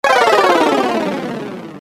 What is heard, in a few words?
errrouuh